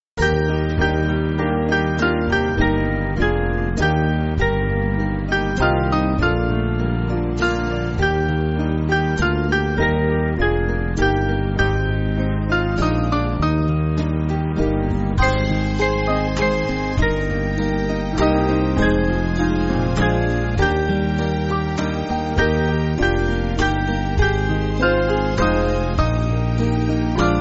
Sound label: Lie Still And Let Him, band